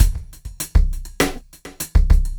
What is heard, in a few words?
brush muff, drums; Loopasonic